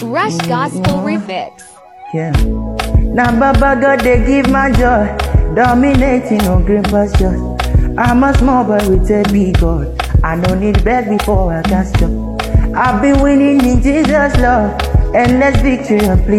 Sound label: Gospel Refix